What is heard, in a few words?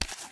m9 pickup; svencoop